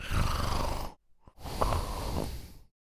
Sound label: Adult male snoring and breathing